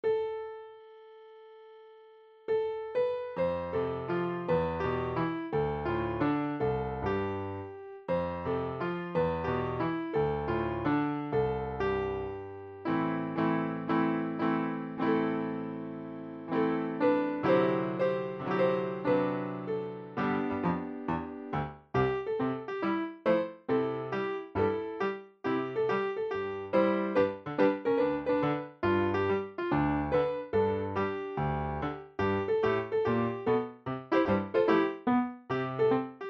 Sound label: instrumental